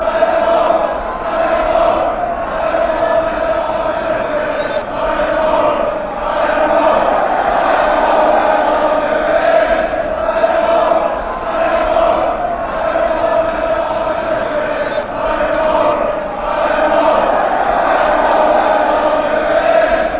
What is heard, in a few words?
beerschot chant